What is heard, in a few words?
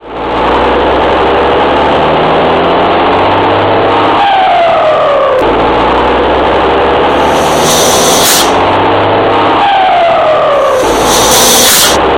motor ringtone; android